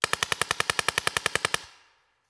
Paintball Gun; Sound Ideas; Guns

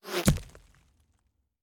Bullet Impact; Sound Ideas; Guns

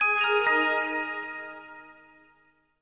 connect; Quentin C's Gameroom

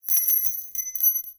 Nails Falls on Ground; Tools; 1001 Sound Effects

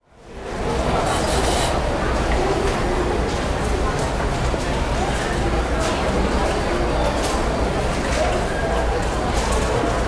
Station Busy Bus, Walla; Sound Ideas; Walla Series

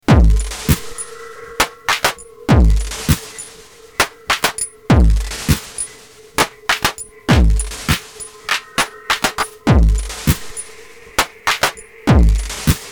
Electro; RoosterFX

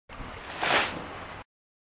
Animal effects snorts grunts farm ambience

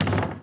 Street Ball Glass Back

